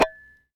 Bulldog clamp

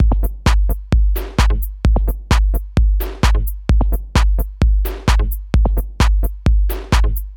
130 Bpm Minimal Beat

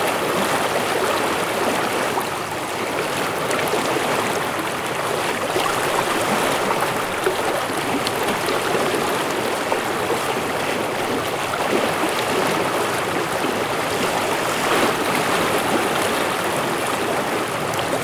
Musconetcong River

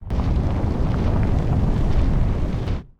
Building Collapse Demolition

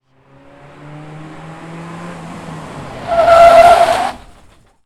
Car Stopping

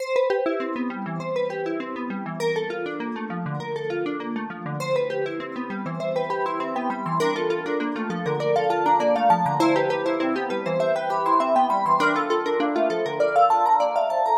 Cheerful

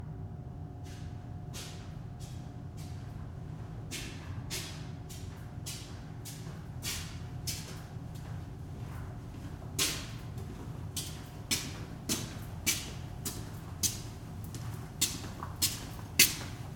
Walk Basement

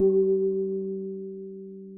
gong

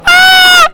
Woman Yelling High Pitched

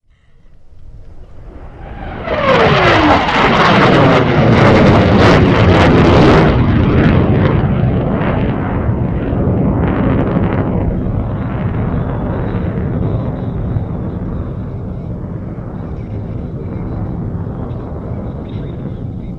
F18 Flyby

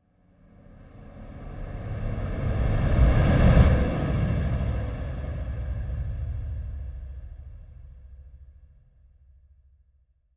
Dark Riser Buildup

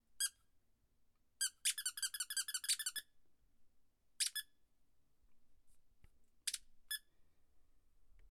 Squeaker Toy

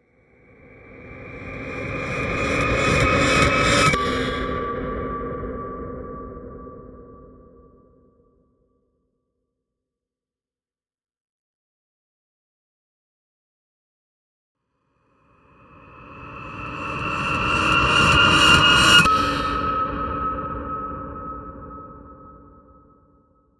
Colossus Becoming Armoured Metal

